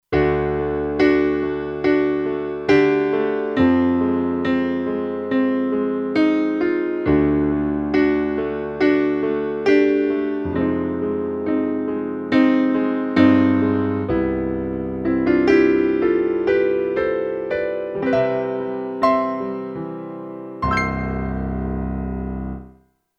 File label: Studio Grand, Kurzweil K2500X; Factory Programs